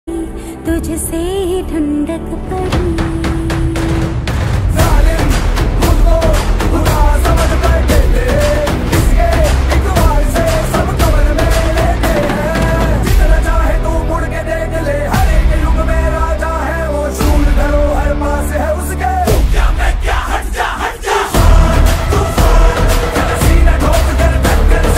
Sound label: hindi ringtone